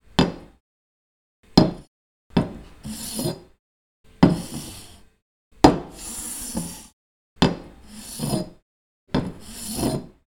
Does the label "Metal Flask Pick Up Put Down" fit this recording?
Yes